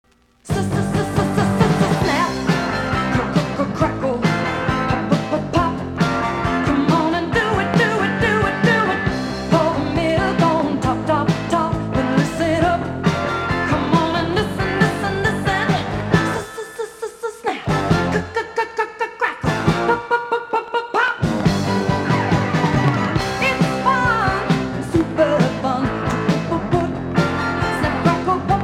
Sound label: New Wave; Snap Crackle and Pop